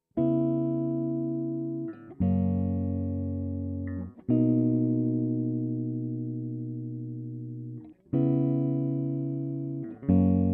Jazzy

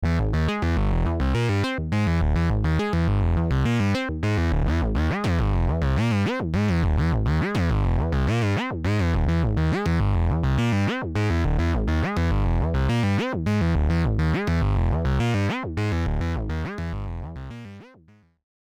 Auto Glide

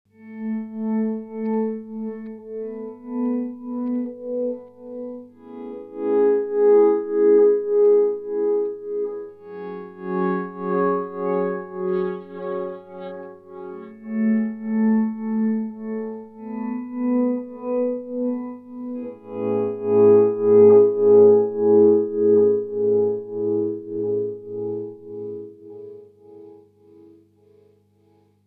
Duophony YX Mix LFO; GFI System

